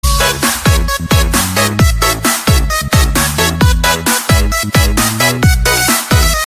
Remix, tono de llamada

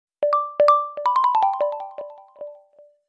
Oneplus 9 Pro Notification

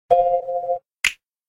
Galaxy S9 Snap Notifications

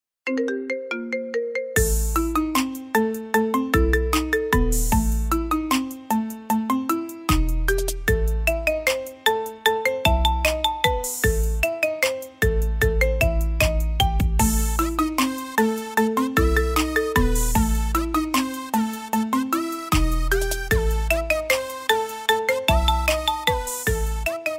Marimba

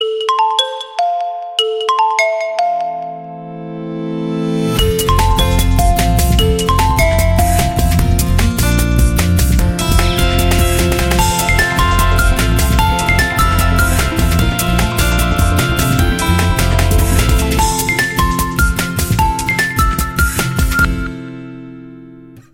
Oppo Original, tono de llamada

